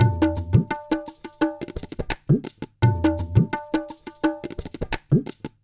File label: Tabla and Dolak 85 bpm; Loops; Drums; Percussion; Ableton Live 12 Lite; Core Library